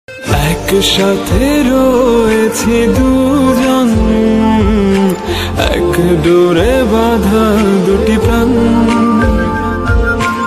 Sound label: BENGALI RINGTONE